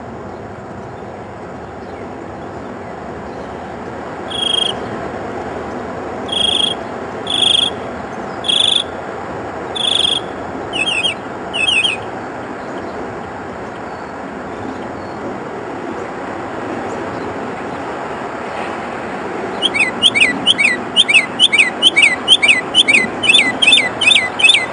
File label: mockingbird song